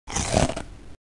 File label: cronch; baldi